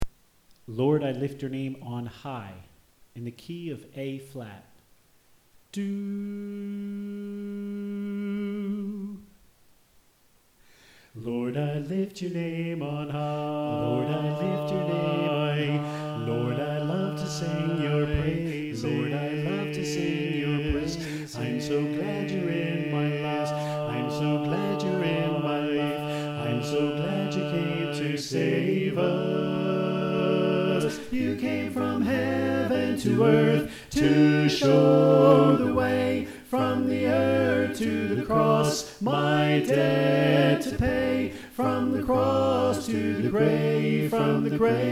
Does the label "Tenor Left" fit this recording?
Yes